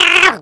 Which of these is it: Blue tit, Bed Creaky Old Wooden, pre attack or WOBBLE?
pre attack